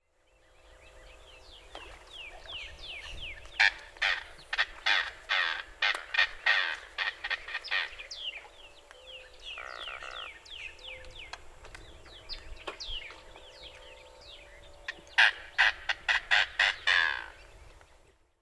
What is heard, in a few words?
Adult Male Anhinga calls